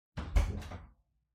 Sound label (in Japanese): 扉が開けれる音